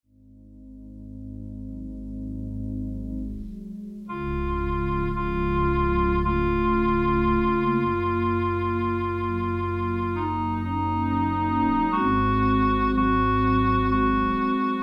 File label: organ